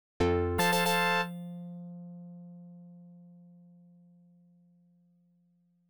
Wave Complete; Off the Wall

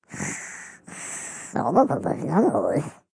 China Sleep; Cut the Rope: Time Travel

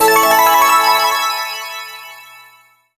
Clear High; Rusty's Real Deal Baseball; Nintendo 3DS

